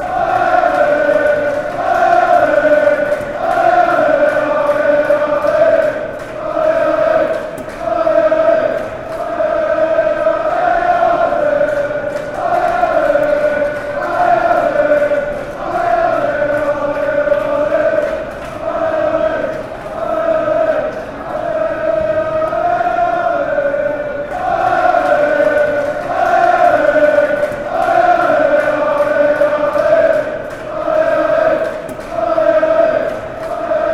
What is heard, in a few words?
ole ole, fanchants